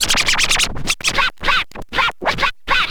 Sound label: scratch short, Vinyl scratches